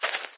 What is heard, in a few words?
foot walk; There; ThereClient